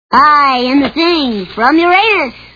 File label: fart sounds; funny